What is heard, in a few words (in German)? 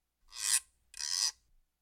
Suedsee Guiro